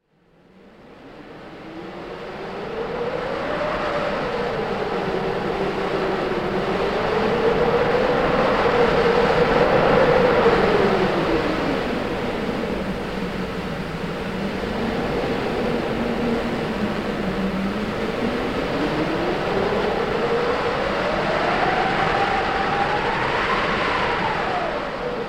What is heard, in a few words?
sound oasis wind